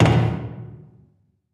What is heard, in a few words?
footstep; Barotrauma; Barotraumatic; xanHuskmutantarmored